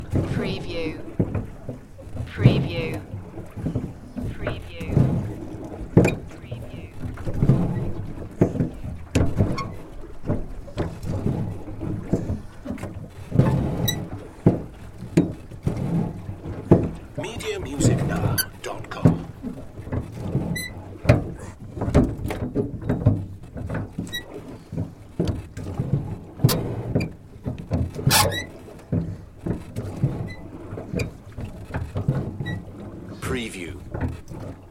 Rowing

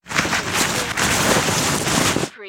Snow Dig Movement; sound effects bible

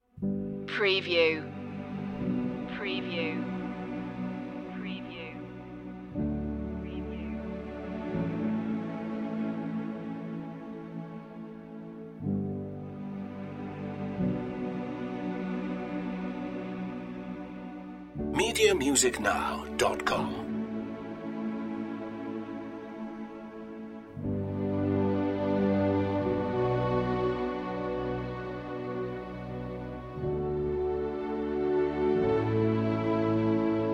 no perc

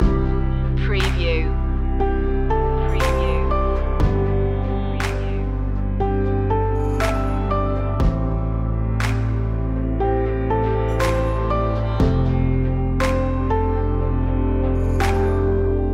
summit loop

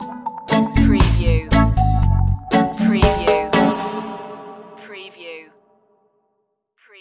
jamaica feeling stinger

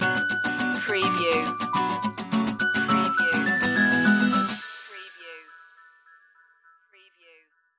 adventure plus stinger

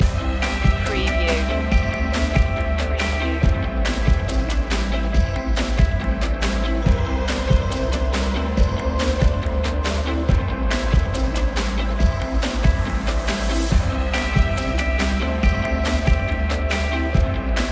creative days loop

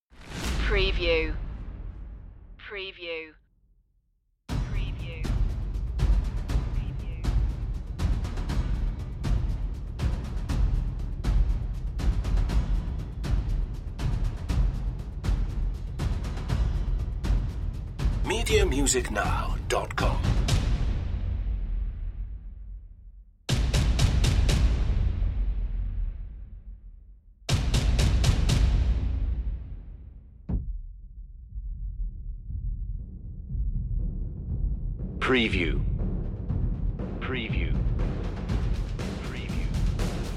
perc only

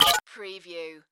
Hi-tech Panel Servo Button

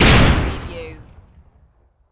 EXPLOSION CLOSE PERSPECTIVE SHARP BLAST

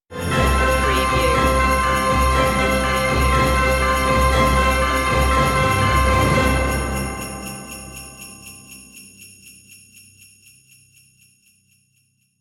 ho ho ho merry christmas stinger no vocal; media music now

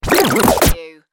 Turntable Scratching Reverse

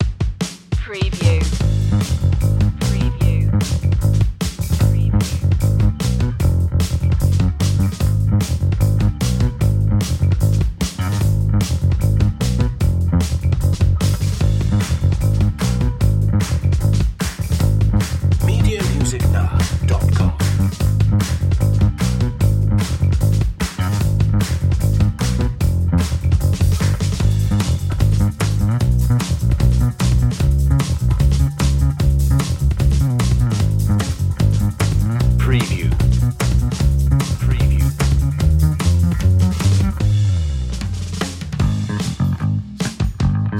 crazy ride drums and bass

